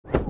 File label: btn pressed